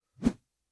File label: Swing; Gameplay